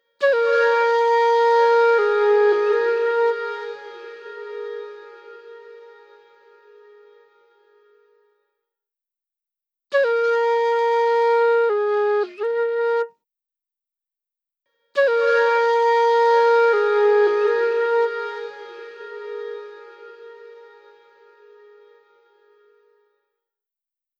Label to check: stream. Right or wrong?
Wrong